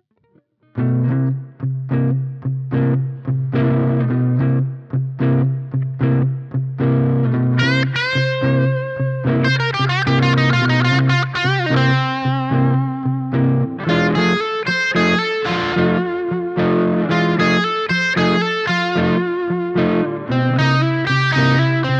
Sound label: Katana Blues Drive